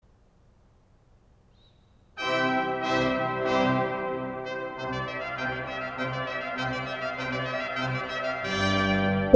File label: concerto for 2 trumpets in c major